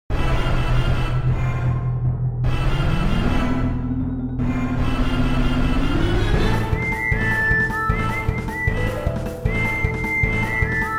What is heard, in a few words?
boss battle